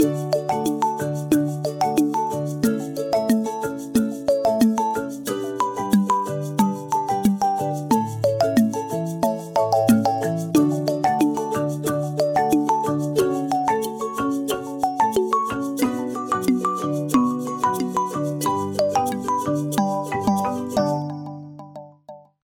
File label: love dove ringtone, english